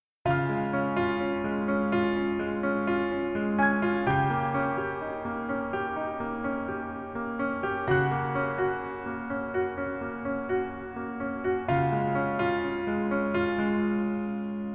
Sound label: Piano ver